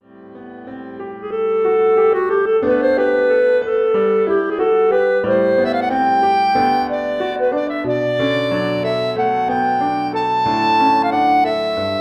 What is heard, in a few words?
clarinet in Bb solo + PA